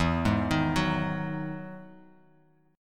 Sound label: E Major 9th E, Guitar, Open F; arpeggiated